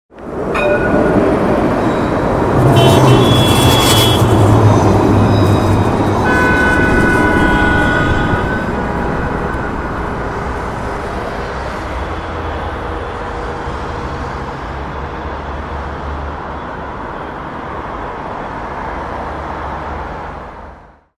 Street

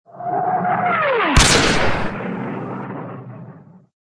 missile boom

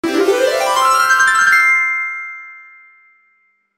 Level Up Sound Effect

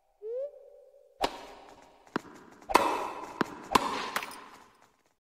TENIS Sound Effect; Wii SPORTS

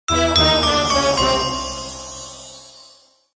Game Fail

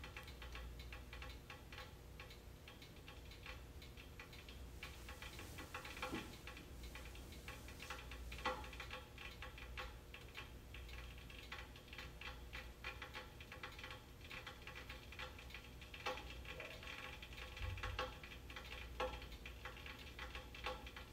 Boiling Water ASMR Kettle

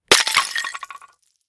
pot break sound

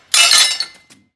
pot break sound

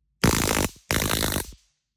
POLICE Stun Gun

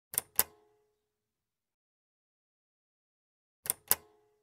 Radio Switch Turn On

